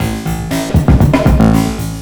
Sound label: FX BEAT; Big Beat Drumloops; FX BEATS; AKAI